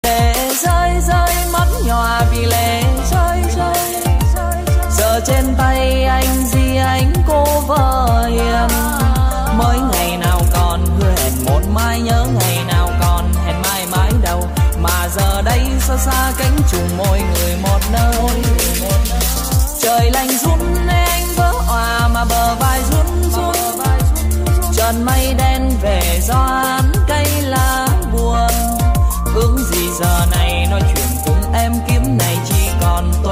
REMIX, NHAC EDM TIK TOK

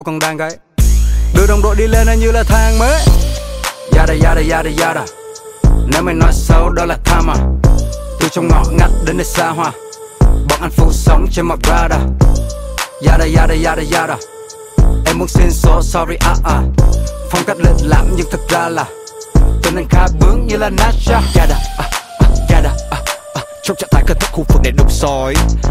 nhac chuong rap

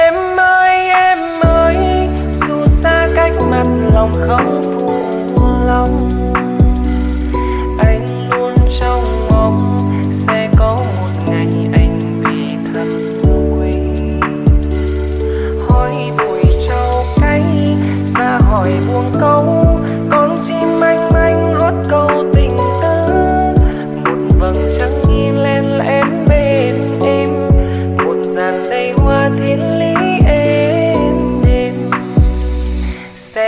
Nhac Chuong Lofi

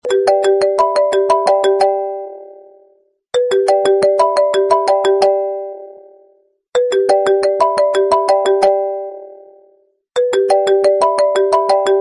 Nhac Chuong Iphone 4s

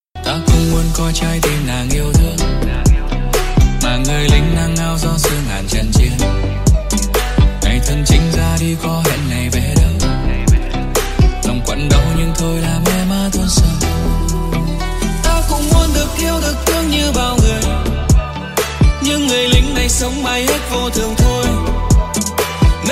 Nhac Chuong

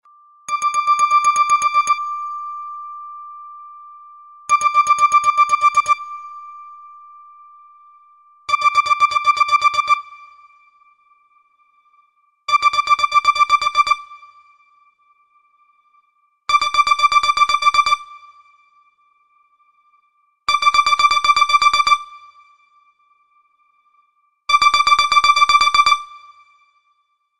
bao thuc iphone 14 pro max

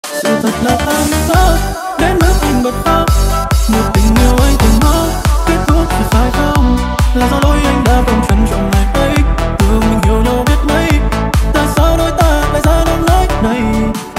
nhac chuong remix

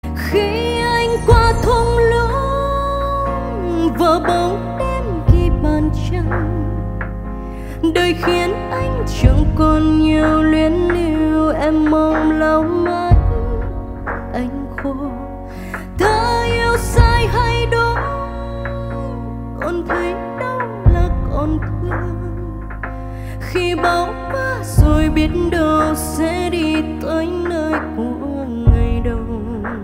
Nhac Chuong; nhac tre

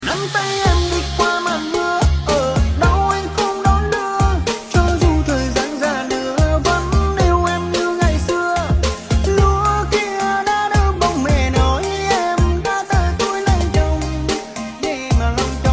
tiktok giong nam

